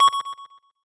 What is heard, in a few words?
sound countdown; yule